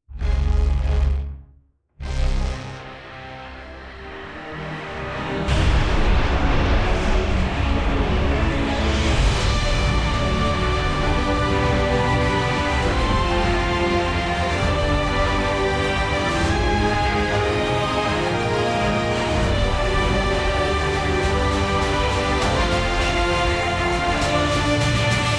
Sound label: epic